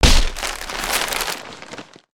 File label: Paper shoot